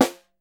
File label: SN, Percussion